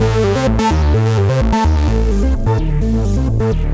Modu Arp, 128bpm, A, analogue circuit; musicradar

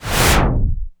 cinematic impact wipe

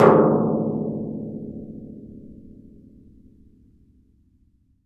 TMP EN HAN, World & Orchestral Percussion; ILIO; Synclavier Percussion 2

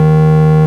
ORG, Farfisa Clarinet

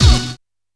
G5 Uh! Hit, Yamaha PSR-E323 Sound Effect Kit

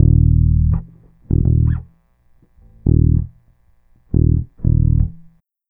Bass, Trip-Hop Loops; Sonic Foundry (Sony Creative Software); Rondo Brothers Trip-Hop Loops & Samples; Hard Rocks The Roof

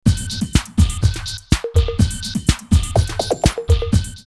124, drumloops Techno&House; Asylum; X-Static Goldmine

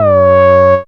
f#, Analogue FX, Dance Synths

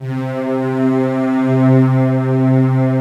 ANLG STR, ANALOG STR

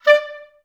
STAC D4, Advanced Orchestra; Best Service; Peter Siedlaczek's Advanced Orchestra

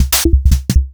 Fricke MFB 502 Kit

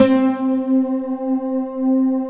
D50 NYLON; STRINGSYN; Alpine Volksmusik

